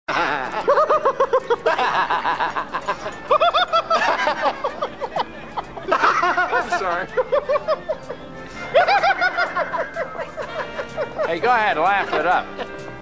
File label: laughing